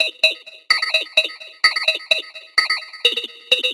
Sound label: 128BPM Melody; VFH1 128BPM Crowded Kit